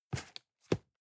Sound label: cardboard box close fold single; objekte